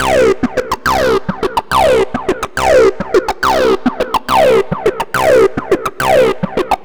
140 House Arp Cmin; Dance; DJ; Synth; Arps; Pro Tools Essential Loops; Digidesign

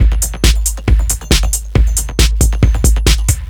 Dance Beat

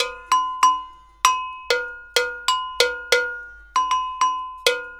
096 Hip Hop Agogo, Bells, Percussion; Digidesign; Pro Tools; Pro Tools Essential Loops

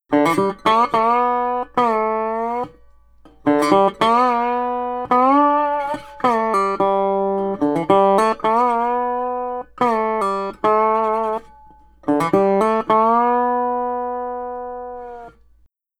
Country Dobro Chorus; Digidesign; Pro Tools Essential Loops

